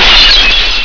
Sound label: GLASS; psion cd vol1